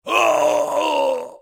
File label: Male Fight Death; Mighty Party; Android